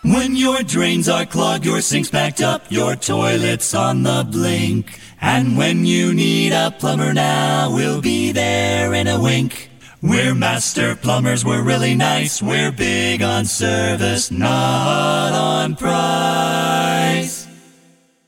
acapella, jingles; PLUMBER

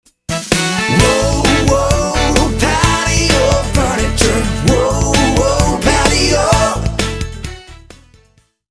Furniture, furniture store jingles; Paddy O Furniture

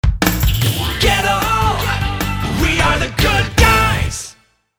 JINGLE; Goettl Good Guys; hvac heating cooling jingles

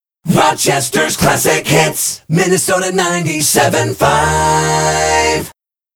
Station ID Acapella; tv station jingles

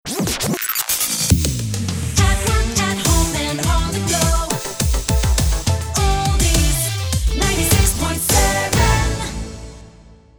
Oldies, tv station jingles

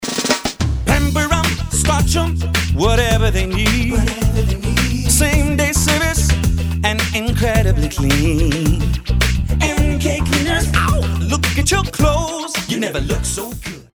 Cleaners, dry cleaner jingles

